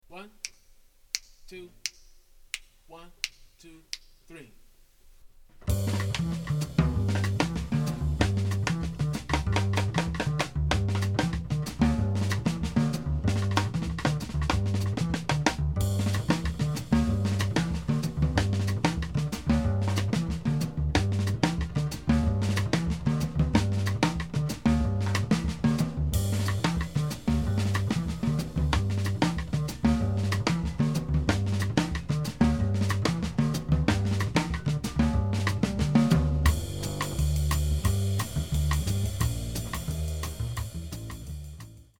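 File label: bass drums only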